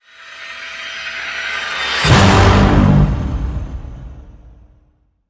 Hit Orch Release The Beast G

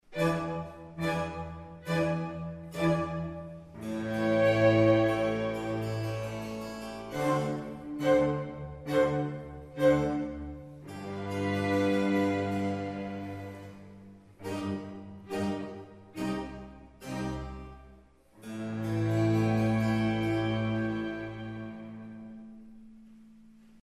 Concerto for 2 violins cello strings & bc; in D minor; Concerto No 11; Antonio Vivaldi (PD)

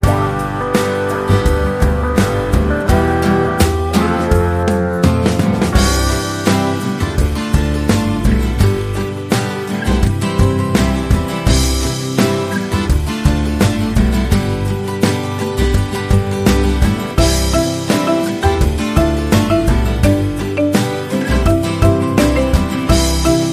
Baja Lap Steel

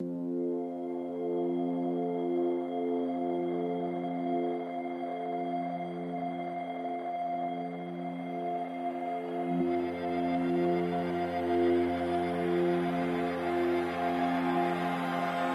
Minimal Mix

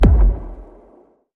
Hit Low Ripple

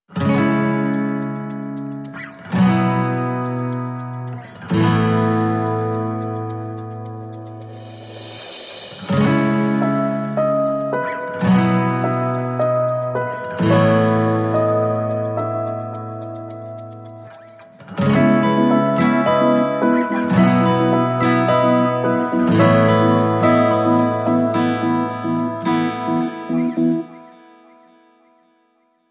Bright Acoustic Days

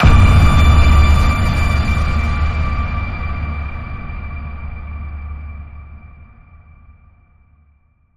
Ping Frequency, D

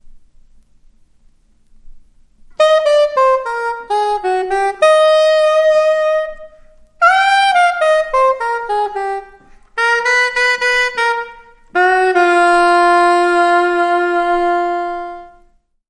Blowin The Ragtime, Saxophone Only; 15sec

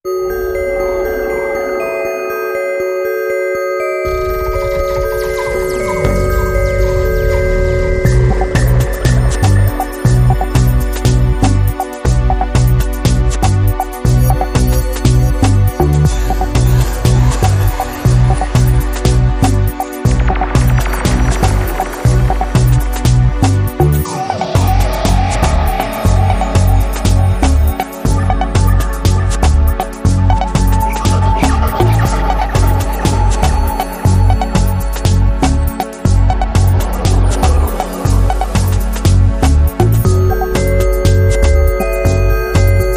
Dub

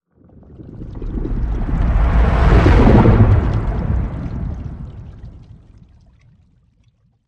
Sea Serpent Transition

